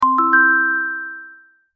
message notification

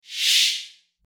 Shimmer Swipe

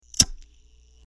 lighting effects

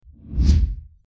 transition sound

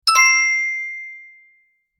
twinkle notification

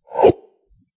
Interface opening

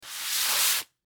dynamic paper swipe

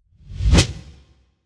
Sword Slash Dynamic

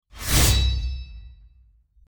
Sword Slash Dynamic

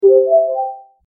message notification

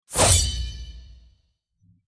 Sword Slash Dynamic

